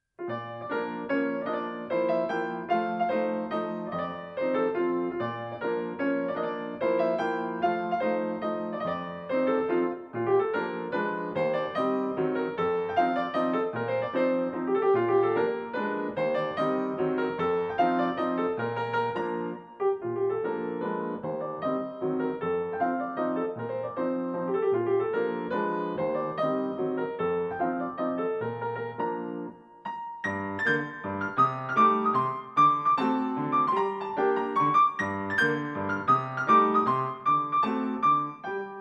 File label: chopin mazurka posth b flat major